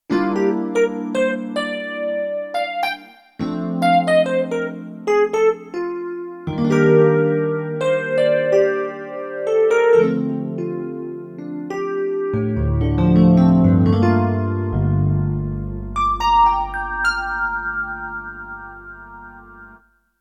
Rhodes, Roland D-70; Factory Performances

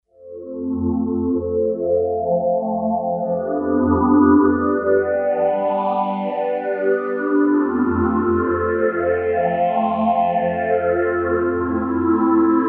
Monks, Kawai K5000S

